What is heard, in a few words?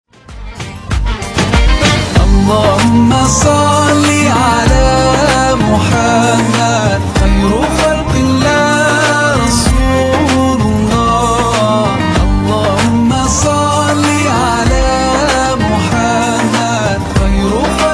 Music zang mobile